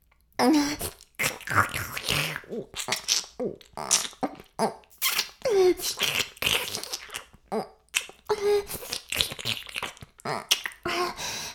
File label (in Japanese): フェラ音声, ASMR; 白くて黒い魔性円環